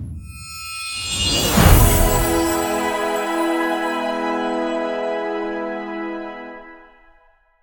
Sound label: UI Score Arena Rank Big A